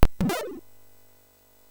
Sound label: Fuzzball, MOS6581R3; sid